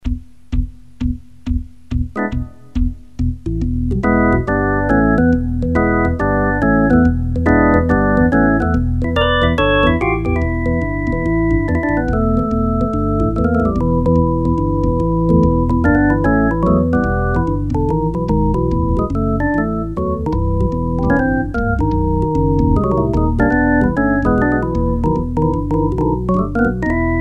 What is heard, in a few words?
Organ